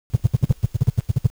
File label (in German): Brumm-Laut